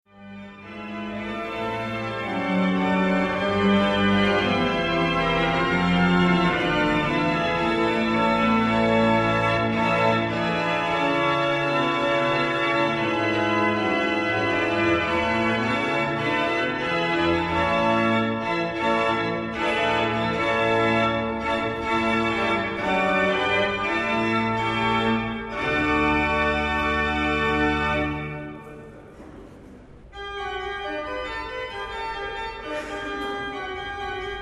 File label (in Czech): varhany; novogotická skříň